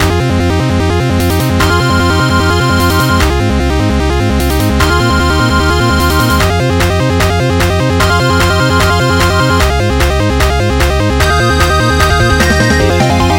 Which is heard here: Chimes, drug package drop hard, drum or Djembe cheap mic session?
Chimes